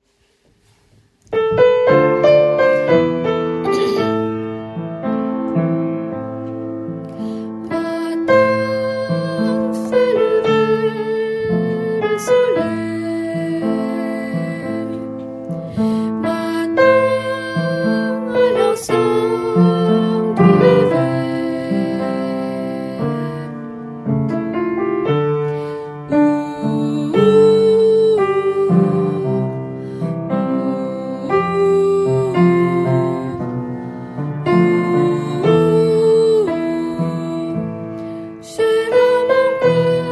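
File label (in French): orpee soprano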